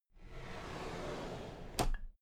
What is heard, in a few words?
drawer cabinet equipment open soft